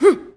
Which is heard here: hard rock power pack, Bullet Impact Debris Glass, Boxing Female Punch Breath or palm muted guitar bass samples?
Boxing Female Punch Breath